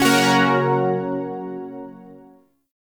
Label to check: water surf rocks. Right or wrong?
Wrong